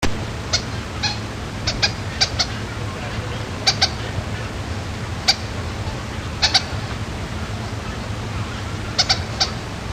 hued

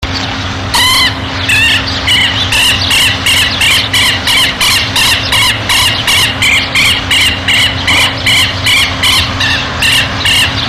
queltehue

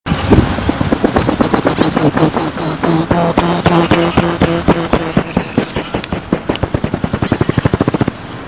chirihue, aves chile

